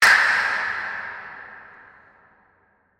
designer scream hit; SoundsCrate

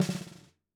snare roll short; soundscrate

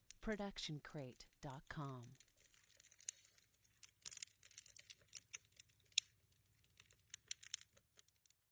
Outdoor Fire; SoundsCrate

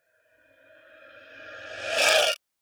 horror deadly swell; soundscrate

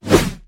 Fast Whoosh; SOUNDSCRATE

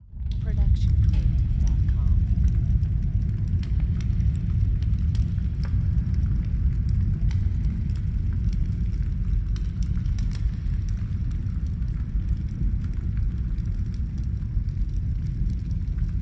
Large Fire Distant; SoundsCrate

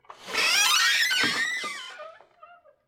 squeaky wooden door open; soundscrate

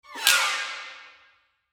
short gliss down

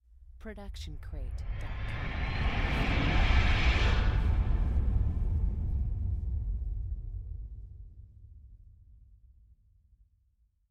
Hydraulic Lifter; soundscrate

